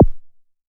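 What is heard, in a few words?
kick, 5U Modular Drums; Polyend; Simulacrum Sounds; 1 Shots; Synths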